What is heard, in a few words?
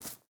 footstep burlap grass jump start; Rust